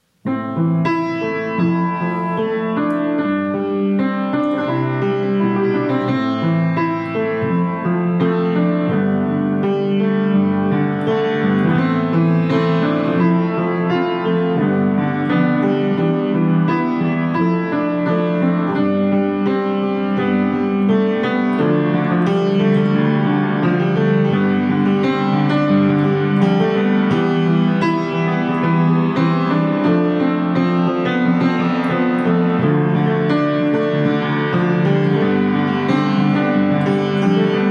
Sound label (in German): klavieremotionen